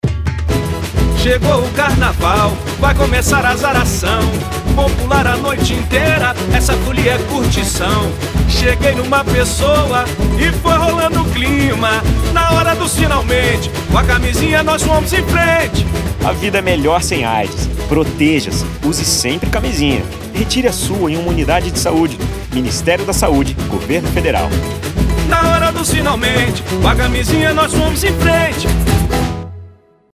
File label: JINGLE SAMBA ENREDO